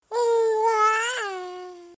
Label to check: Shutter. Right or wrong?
Wrong